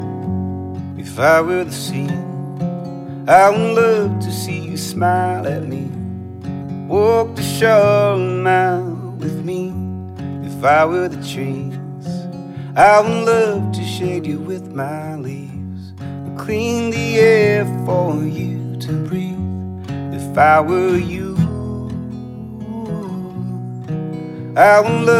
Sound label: Acoustic Version